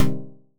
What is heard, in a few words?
short tech house synth pluck Cm